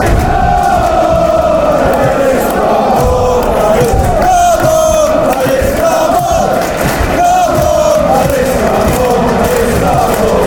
come, chants; palace

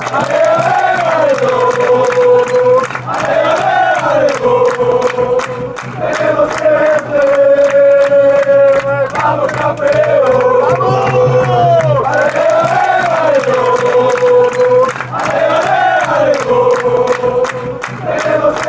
ale champion, fan chants

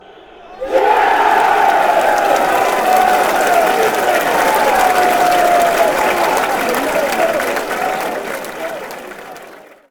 yeah

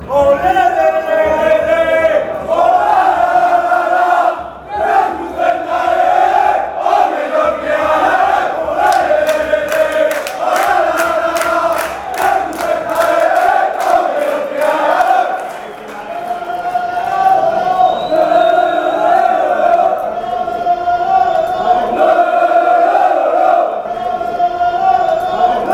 lalala

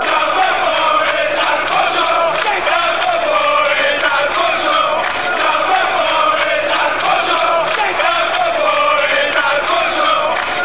number 4, chants; adam forshaw

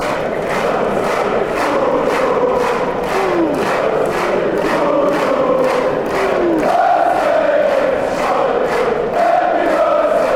happy birthday fanchants

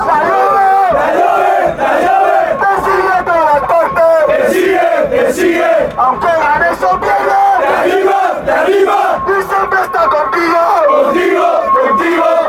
with you, fan chants

